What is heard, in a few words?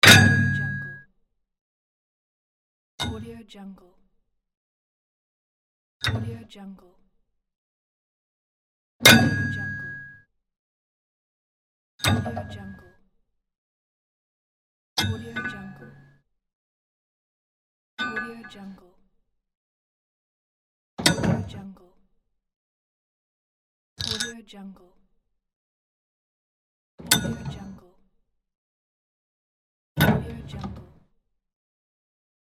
training in the gym